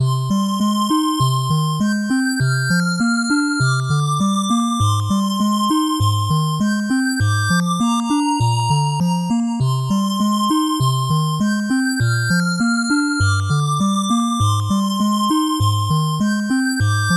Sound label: 8bit bgm fast